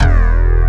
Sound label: WAVE SBA